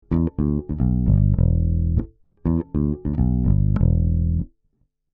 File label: DI Bass 15dB Gain; Rupert Neve Designs 517